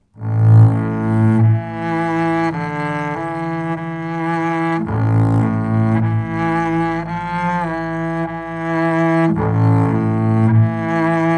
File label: Bowed Dramatic A G String